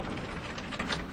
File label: roll in; soundspvz